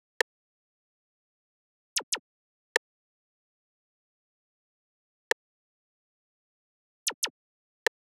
Drum Loop 94 BPM Percussion, Hip Hop; Cymatics; Los Perro

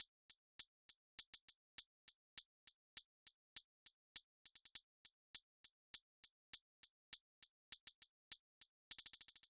Drum Loop 101 BPM Hihat; Loop Stems; Cymatics; Vibes II Drum Loop Collection; Windy

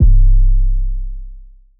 808 C; Cymatics; Oracle Sample Pack; 808s

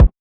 Kick - F#; Drum One Shots; Cymatics; Cobra Hip Hop Sample Pack

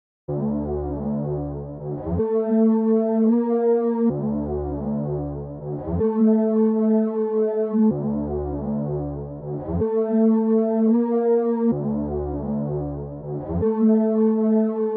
126 BPM D Min Filtered Bass, loop stems; Cymatics; 2022 Melody Collection Platinum Expansion; Fusion

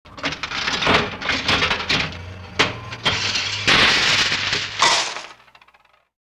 Slot Machine Jackpot; Vintage Cartoon; Foley

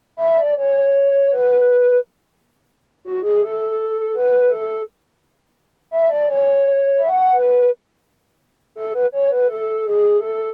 Various Melody Loop - 168 BPM F# Min Flute; Melodies; Loop Stems; Cymatics; Oracle Sample Pack

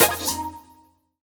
Impact FX

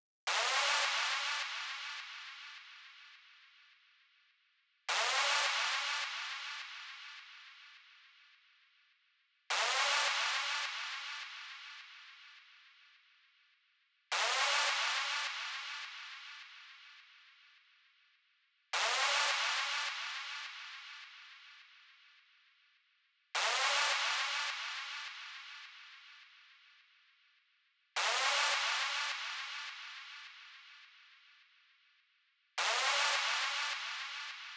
104 BPM F Min Hoover; Melody Loops; Edge Runner; Cymatics; ANALOG EVOLUTION Beta; Cyber Collection

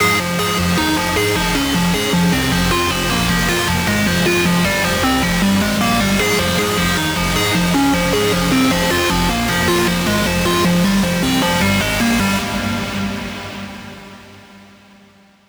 lead loop Fmin 155; Music Loops; GhostHack

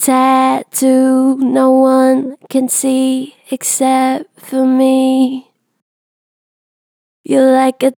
Gen Vib, Natural Vibrato Gentle, Vocals; Harmor Vocals

